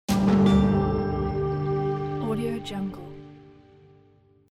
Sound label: antique greece lose; tibasound